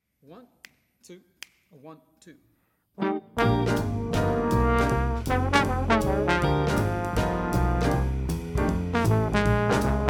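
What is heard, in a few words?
Jazz Etude Trombone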